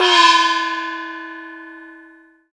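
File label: LARGE GONG, CHINESE PERC; Spectrasonics - Heart of Asia CD2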